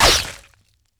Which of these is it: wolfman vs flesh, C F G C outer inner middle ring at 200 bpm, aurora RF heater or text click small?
wolfman vs flesh